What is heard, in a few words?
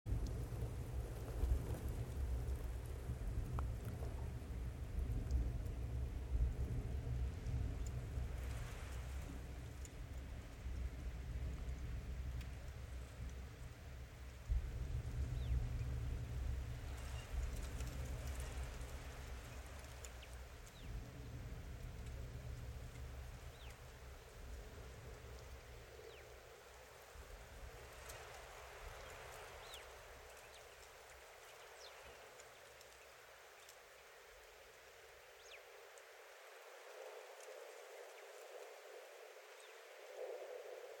tone